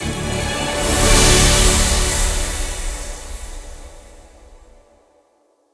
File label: large Win Loop End; fairyhansel